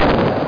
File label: pistol